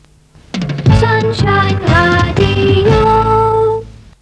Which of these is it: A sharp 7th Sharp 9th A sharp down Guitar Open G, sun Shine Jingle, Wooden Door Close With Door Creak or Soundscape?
sun Shine Jingle